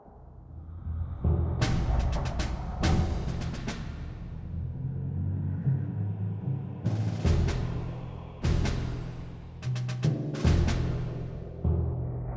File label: Menu Ambient; windows gamerip 2002; napoleon